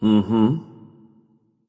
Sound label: agreement; smite; voicepack; hou yi